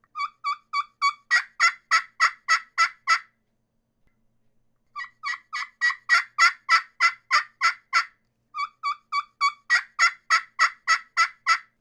clear crystal yellowheart keekee runs, turkey; Southland Sounds

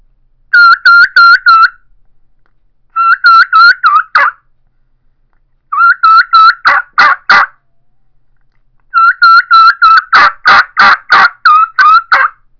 rio grande special mouth call kee kee runs; Southland

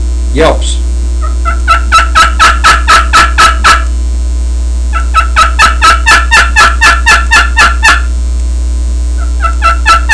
stainless yelps, turkey; Southland

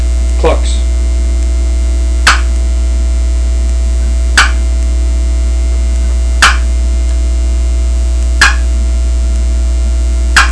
old timer clucks, turkey; Southland Sounds

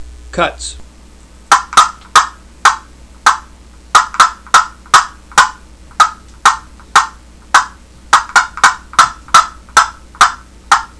cedar cutts, turkey hunting; Super Yelper

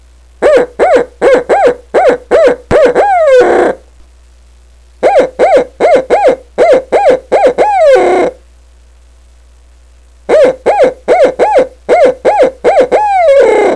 owl, turkey hunting

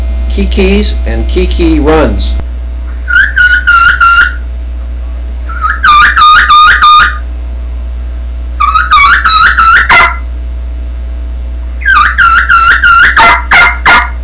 challenger kee kee kee kee run; Southland Sounds

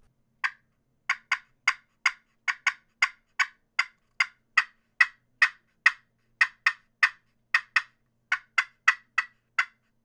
clear crystal yellowheart cutts, turkey; Southland Sounds

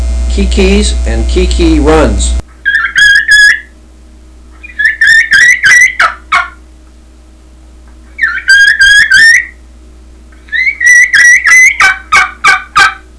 mini keekeekeekee runs; dixie

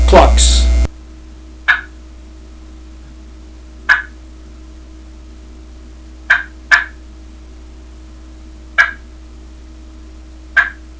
clucks, turkey; dixie

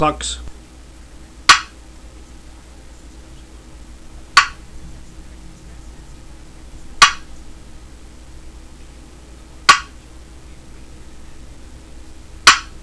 cedar clucks, turkey; dixie

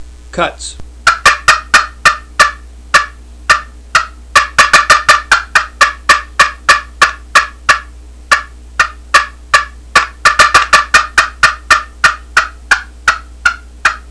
push pin cutts, turkey calls friction box; Quaker Boy

